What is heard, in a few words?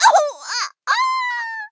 Death